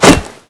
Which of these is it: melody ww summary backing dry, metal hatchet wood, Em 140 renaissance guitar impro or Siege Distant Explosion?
metal hatchet wood